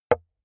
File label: metal impact wood light